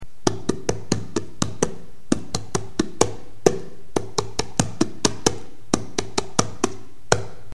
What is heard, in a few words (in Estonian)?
trumm